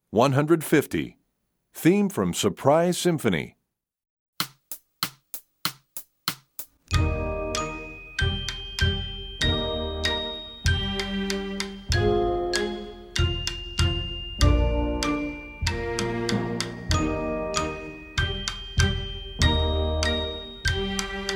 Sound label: Surprise Sym, Percussion; EE2000 Book1